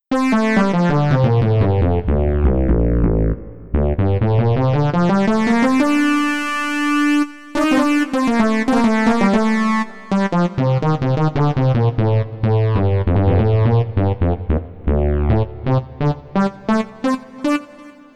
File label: lead fm lead; the transmitter soundset